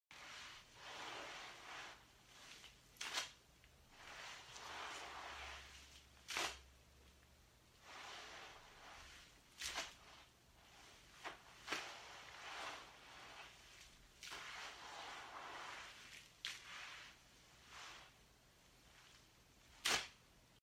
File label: Mop